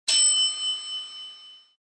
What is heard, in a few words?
Elevator Bell